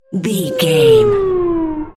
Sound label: sci-fi alarm siren object pass